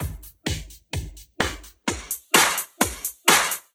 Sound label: Fill 128 BPM; Vengeance Samples; VEH1 Loops